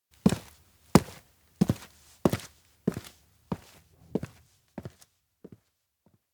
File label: steps indoor workshop walk away; minato; anemoi